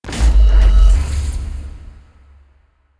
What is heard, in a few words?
Trailer Hit Subway Shock